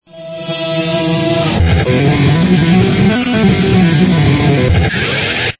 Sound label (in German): klavier, reverse